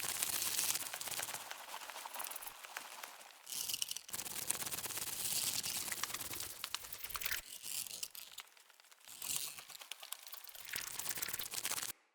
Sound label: Moth Idle Loop, Insects